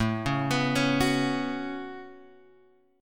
A 6th Add 9th A down Guitar Open G

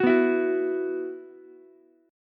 E Suspended 2nd, Ukulele; Esus2 strummed

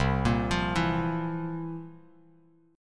C 11th, C, down, Bass Standard; C11 arpeggiated

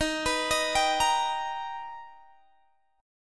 B Augmented Major 7th D sharp, chord

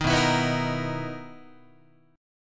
B-Major 11th Guitar, BM11 chord; strummed; JGuitar

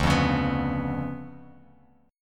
D Minor Major 13th, down, Bass Standard; DmM13 strummed; JGuitar

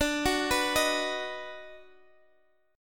D sharp Minor Major 7th Sharp 5th D, chord

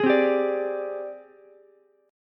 G sharp Major Sharp 11th, Ukulele C6; G#M#11 strummed; JGuitar

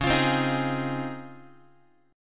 Db Major 9th, Guitar; x,9,8,10,7,7; 4A,4E,5A,5D,5G#,6C#; strummed; chord shape

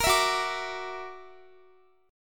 Gb Augmented 7th, down, Guitar; Listen to Gb+7 strummed

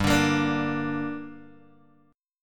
G Minor G down Guitar Open G, chord

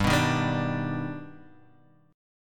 D sharp Augmented Major 7th G, chord

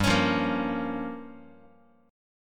G Minor 7th Flat 5th, Guitar; Gm7b5 strummed; JGuitar

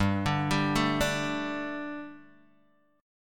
G Suspended 2nd, Guitar Standard; arpeggiated; 3,5,5,2,3,x

